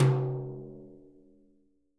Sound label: BRUSHES, Living Drums